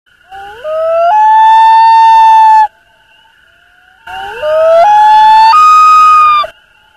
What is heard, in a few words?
wail